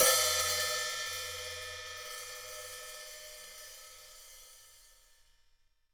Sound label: HH Open up; Digital Sound Factory